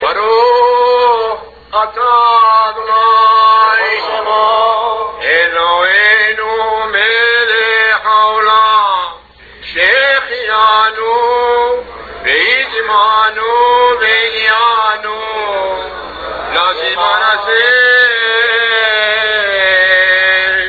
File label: shofar